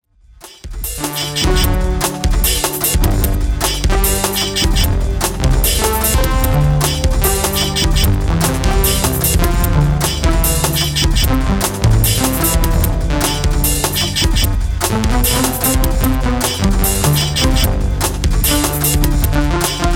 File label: DX7 absynth Waves